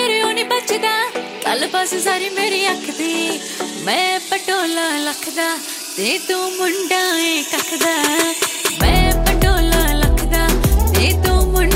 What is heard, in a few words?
punjabi ringtone